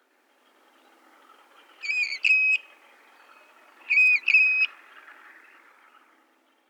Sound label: Western Grebe Aechmophorus occidentalis; waterfowl